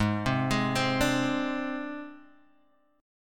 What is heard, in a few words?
G sharp Major Flat 5th, Guitar, Half Step Down